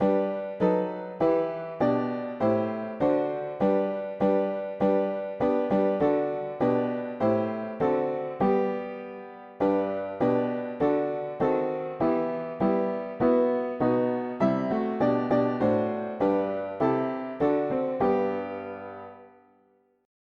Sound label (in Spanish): mono piano; hymns; Himnario pequeno